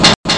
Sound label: clank